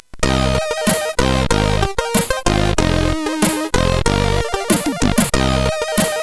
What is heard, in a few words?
FUNKY, CSG8580; FUNKY 02